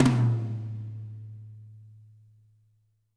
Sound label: TOM, WARM+FAT KIT; Spectrasonics - Burning Grooves CD2